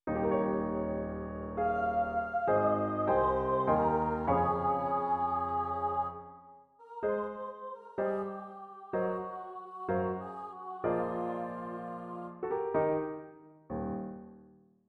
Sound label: Memoir Piano